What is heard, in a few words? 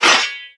Teeth Spikes; Playable Tutorial Level